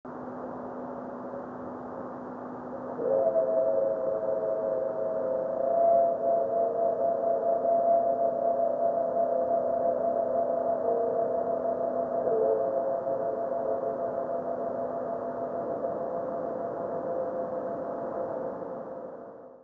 Orionid

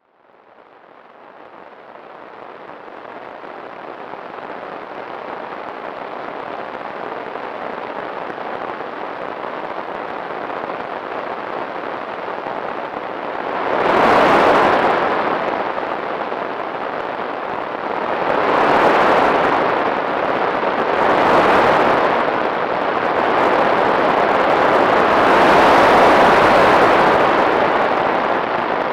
Type II

